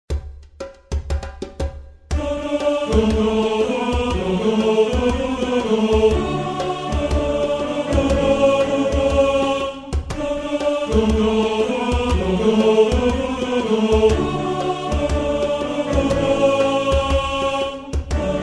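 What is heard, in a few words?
Sananina A Tenor 1, Männerchor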